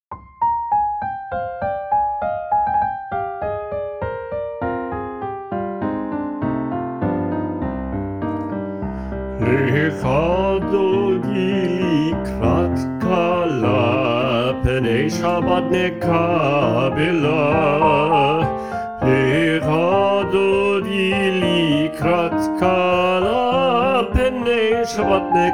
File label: Lkha Dodi, SOPRANO, SLOW